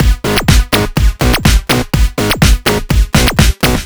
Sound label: 124 Filthy Fury Full, 124bpm; Zero-G; Soundclash; Nu Dance Electro; Acidized Wavs; Combi Loops